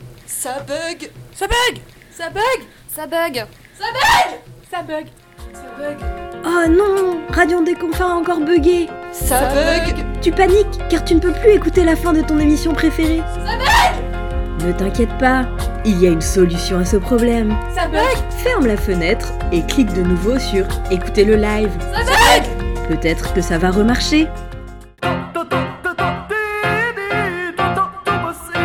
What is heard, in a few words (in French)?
jingle